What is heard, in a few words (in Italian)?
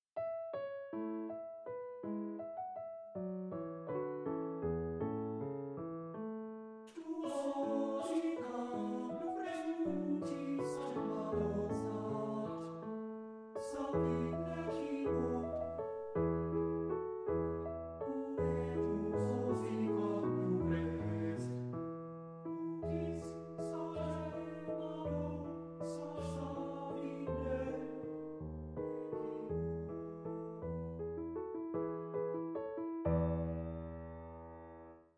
Lied per Canto e Pianoforte, Andantino; Weber; Die spaete Rose